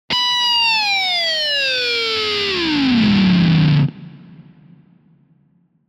Rock Guitar Distorted FX - Going Down Reef - Higher; Hollywood Edge; sound designer's toolkit v2